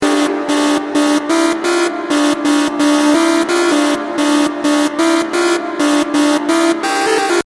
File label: new dutch instrumental